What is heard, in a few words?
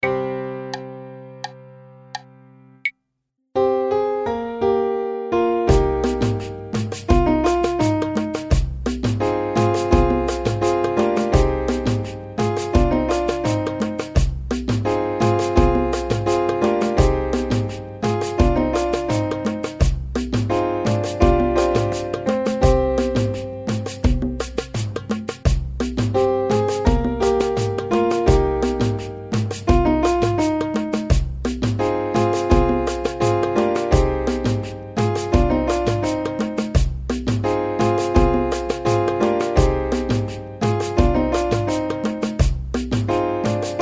Accomp; Sao Roma